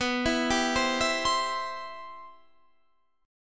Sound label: C Major B